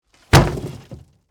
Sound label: Impact Wood Object, Fall, Over, Heavy; Materials